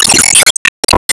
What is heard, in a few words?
Enemy Flame Sizeup; Disney Star Smash; Android, iOS, Mobile